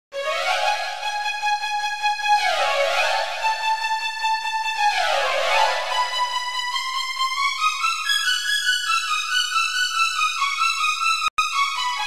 jagata re paibuni emit thakura tie solo music perfect piano